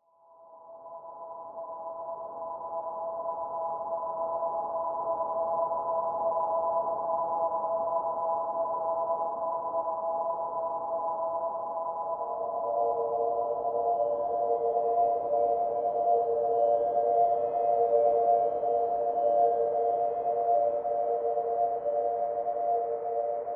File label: Derelict Ship, ambience